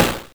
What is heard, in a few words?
Special Snare, Genesis; Sonic 3; M8